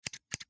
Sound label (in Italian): notifica